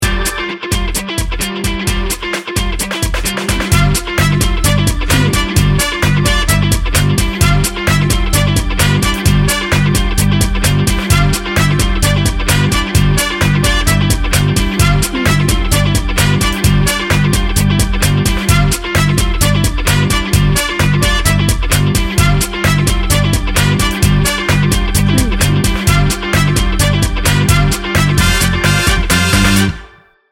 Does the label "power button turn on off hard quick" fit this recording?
No